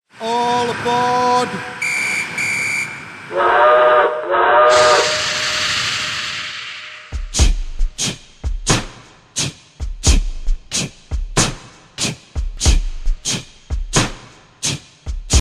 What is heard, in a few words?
Steam train Ride